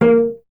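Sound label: strings cello A#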